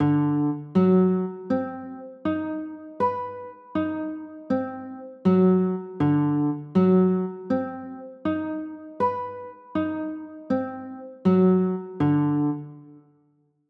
d 13 b9

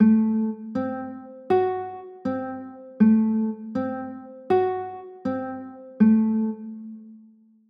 a dim7, chords

